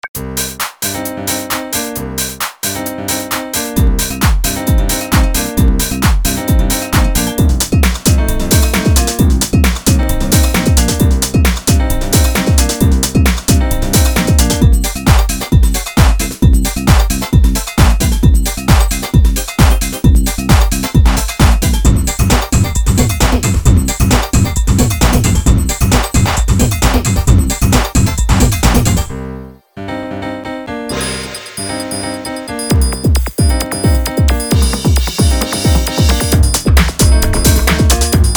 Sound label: Original Instrumental